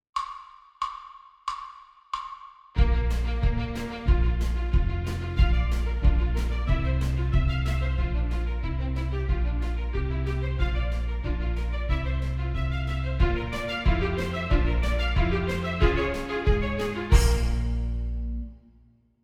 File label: Horns in Fifths; An Accident Ready to Happen